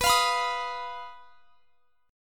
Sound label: B Major Flat 5th; strummed; x,x,21,22,24,23